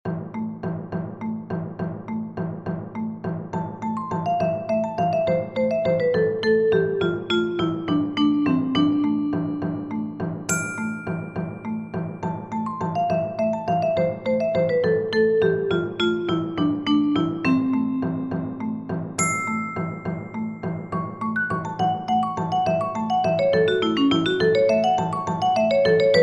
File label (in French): Serenade arabe percu